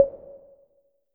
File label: slider bar; 『MarkMoon』